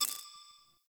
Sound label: Mission UI Hover; Warframe; Zariman